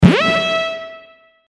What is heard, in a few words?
Guitar Gun Shot; Guitar Three